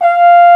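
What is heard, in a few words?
French Horn p F4; Digital Sound Factory Virtuoso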